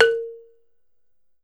BALAFON, African Melodic

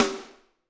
SD, Drums; Bob Clearmountain Drums I

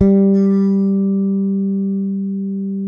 bass, sustain; Sampleheads; Will Lee Bass Library

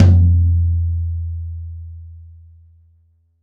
Tom, Akai MPC-500; Q1 Kit

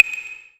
percussion special; Ueberschall; Techno Trance Essentials; DRUMS

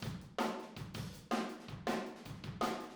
Room, Drums, 162 BPM

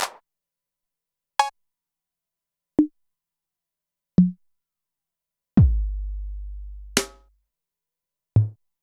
Zoom MRT-3B Kit

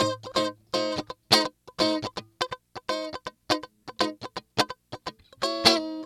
GUITAR; East West; Funky Ass Loops

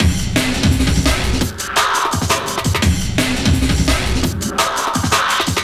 Hammer Break, Drum & Bass, Beats & Breaks; Fluid Dynamics

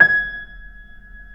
Keyboards Acoustic, G#5; USB Soundscan vol.17; AKAI

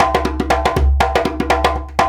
Lamba, African Contemporary

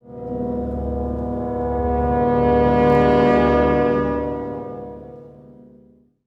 brass accent; Orchestral Loops

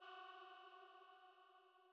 Female Pad F#3

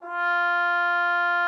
trombone F4; Xsample VOL-3

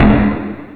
SPACE DRUM; E-MU Formula 4000 Series Vol. 2

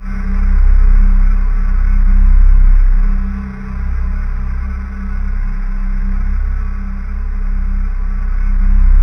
C1, Synth Leads

